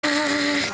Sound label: uhhhhhhhhh